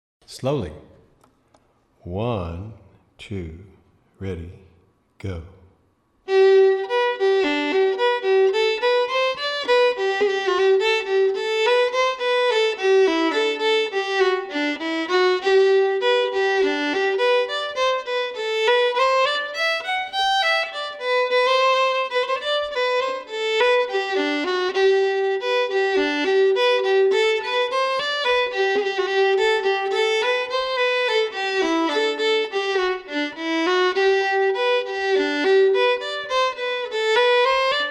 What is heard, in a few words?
slow